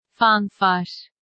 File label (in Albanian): fanfar